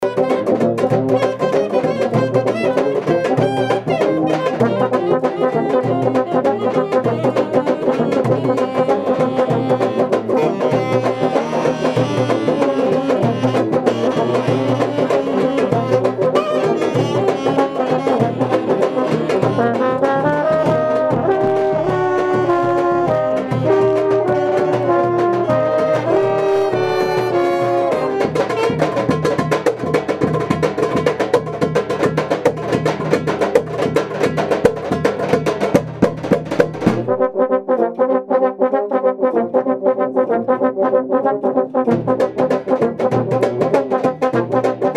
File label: balkan bollywood rehearsal